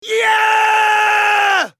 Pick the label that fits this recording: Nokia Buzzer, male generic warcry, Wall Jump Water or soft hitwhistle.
male generic warcry